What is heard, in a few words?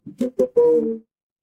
ui call end